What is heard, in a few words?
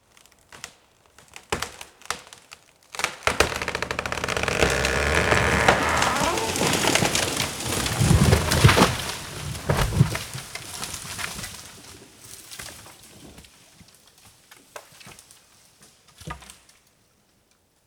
tree falling; Foley Elements Foliage